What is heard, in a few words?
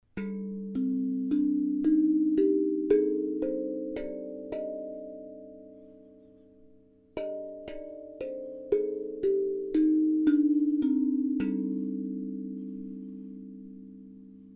Akebono scale hands